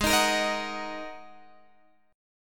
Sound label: G sharp 7th Suspended 2nd G sharp, down, Guitar, Open G